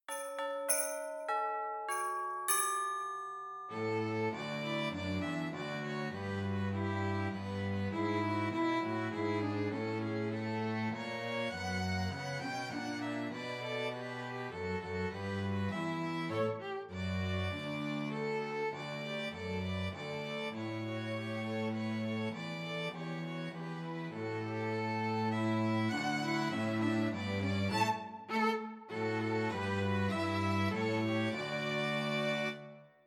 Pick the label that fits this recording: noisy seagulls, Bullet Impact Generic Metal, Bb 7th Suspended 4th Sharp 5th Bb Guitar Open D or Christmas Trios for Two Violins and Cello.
Christmas Trios for Two Violins and Cello